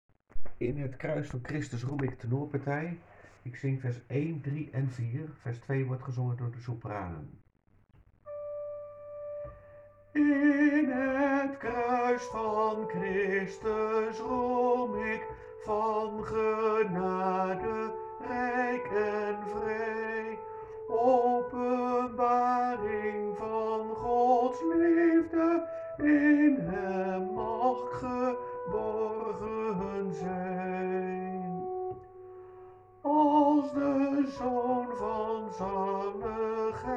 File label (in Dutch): In het kruis van Christus roem ik tenor